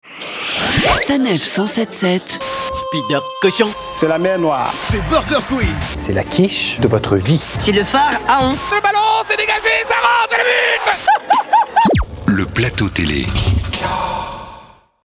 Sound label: Jingle; Plateau TV